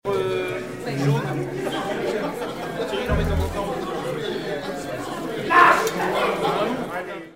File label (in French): Ambiance soirée; bruitage